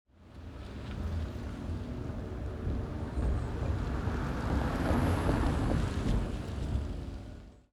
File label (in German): fahrgeraeusch e pkw vorbeifahren; auffaelligkeiten e autos bei unfaellen